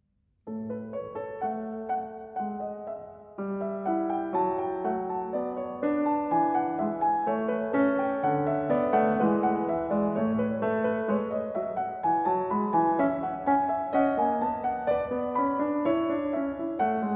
Prelude & Fugue in A Major, BWV 864 I. Prelude

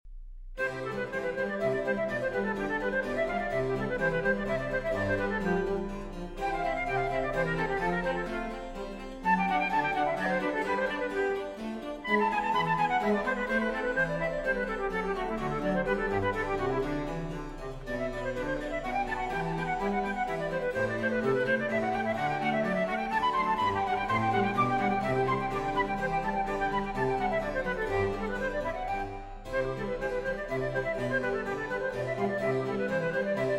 Flute Sonata; Flute Sonata in C Major; II. Allegro

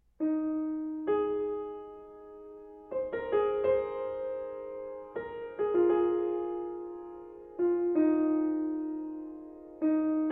Solo Piano

